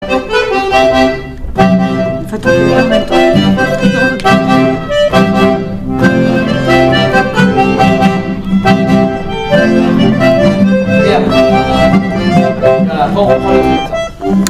accords polka; Finish set

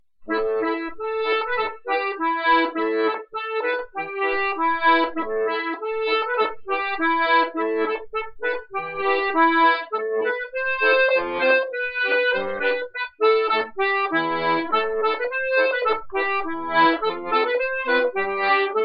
Rondeau des Landes; rencontres-2011

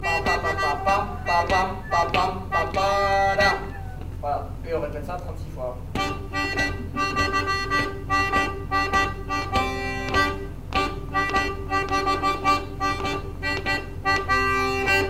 Rondeau (rythmique violons-version étude); Quand son paire l-a maridada

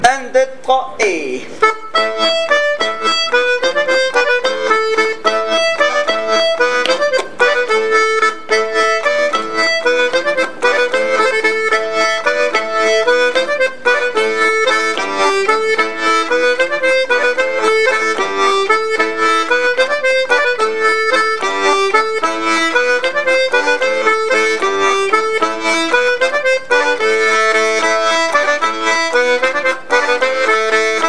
tempo normal; Trinhon de Bergon

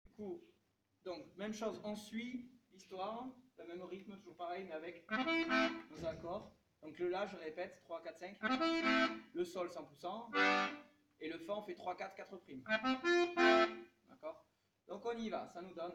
diatos; Musique d-ensemble; Cercle circassien

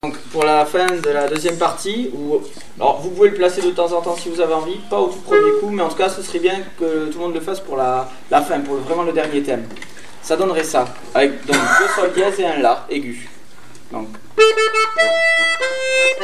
Chapelloise Fin diato

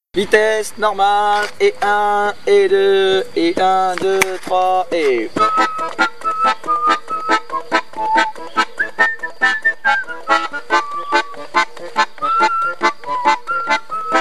accompagnement vitesse normale; Enregistrements St Maurin; rencontres-2014

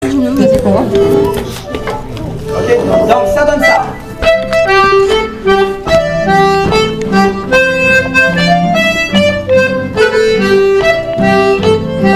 ornements tagada; Finish set

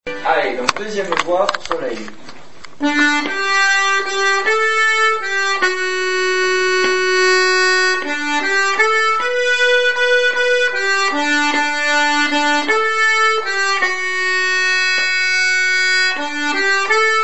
2eme voix; Valse; Solelh

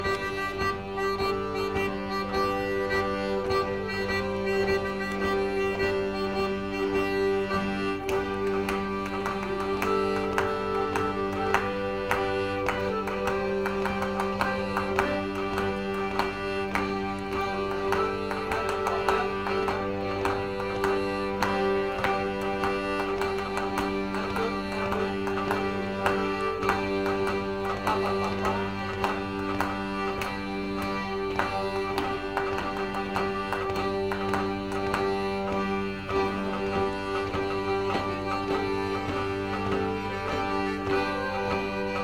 Rondeau (rythmique violons + bourdon sol diatos); Quand son paire l-a maridada

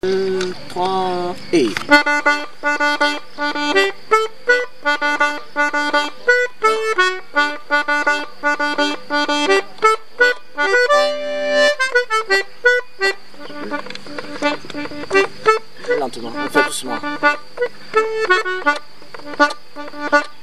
Exercices triplets de notes vitesse lente; Enregistrements St Maurin; rencontres-2014

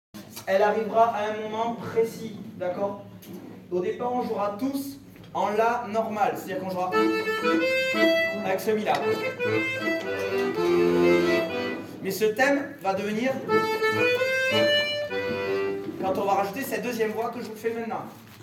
voix 2 violon; Musique d-ensemble

